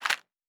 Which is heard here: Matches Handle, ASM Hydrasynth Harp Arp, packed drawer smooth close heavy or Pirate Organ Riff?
Matches Handle